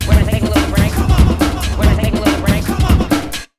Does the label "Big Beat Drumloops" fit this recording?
Yes